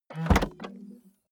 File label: car bmw e46 exterior trunk open